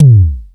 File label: 127 DRM, Fresh Disco House; USB Soundscan vol.20 - Fresh Disco House I